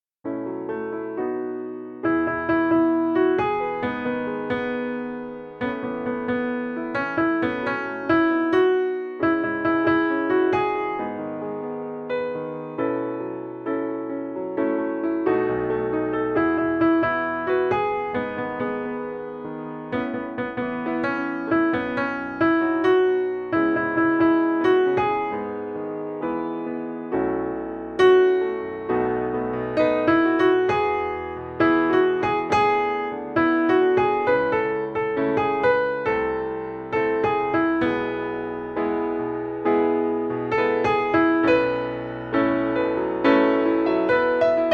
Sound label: Melody; Ariel; Mickey Wndr Book